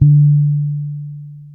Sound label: D 4, ragga, bass; Spectrasonics; Bass Legends CD 1